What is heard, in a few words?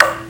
soft hit normal; Kurisu Makise v2.0